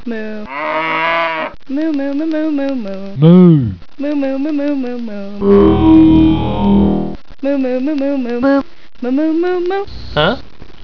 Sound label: moo; Moo Sampler